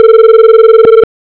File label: ring; webphone